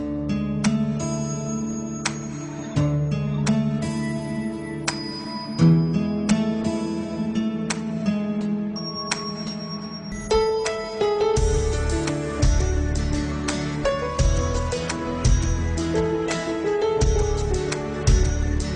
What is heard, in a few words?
BEST INSTRUMNTL